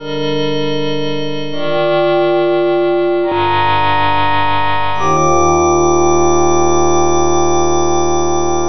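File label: organ chords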